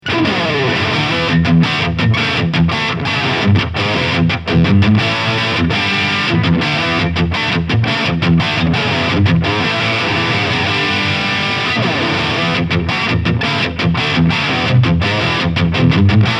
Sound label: Lead Bridge Pickup